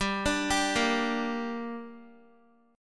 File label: G Minor G down Guitar, chord; Gm arpeggiated